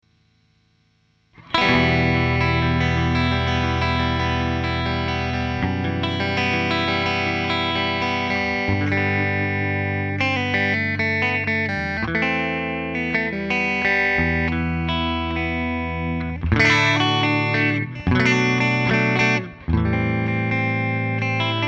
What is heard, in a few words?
Reggae